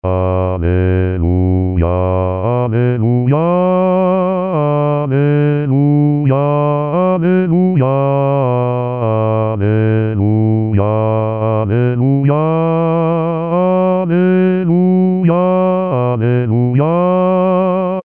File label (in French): Alleluia Festif, Chorale; 2016 Paques